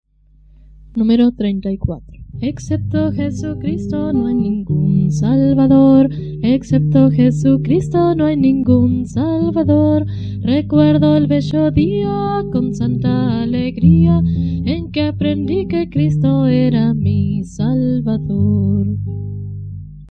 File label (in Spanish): Coro, himnario niños